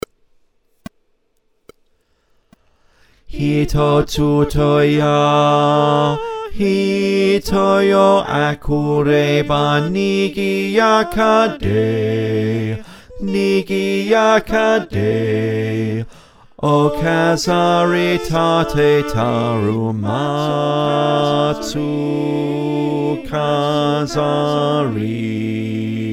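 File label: bass, choir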